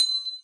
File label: BELL